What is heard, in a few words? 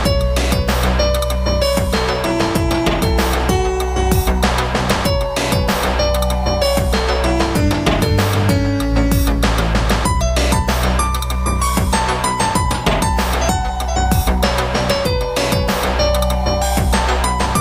Dark Groove